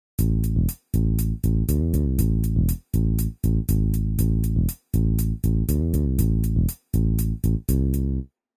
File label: vib bend, bass